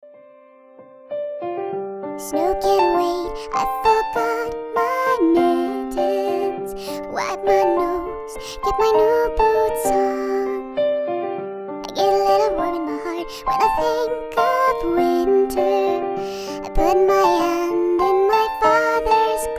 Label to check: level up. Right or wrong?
Wrong